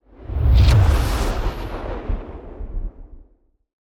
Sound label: mine thrower whoosh